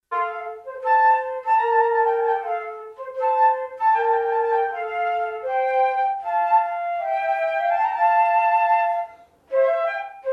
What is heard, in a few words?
duettino